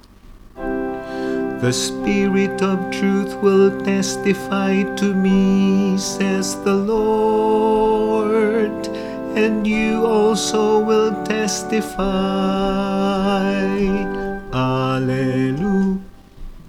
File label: Alleluia Verse